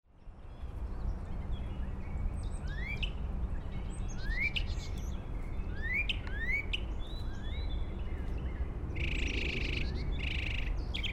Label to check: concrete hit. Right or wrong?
Wrong